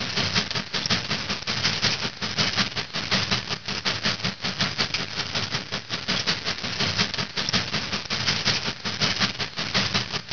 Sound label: train